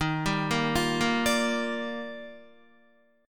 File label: Eb Major 9th, Guitar; EbM9 arpeggiated; JGuitar